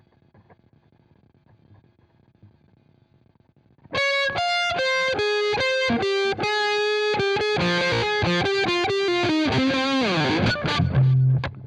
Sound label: Guitar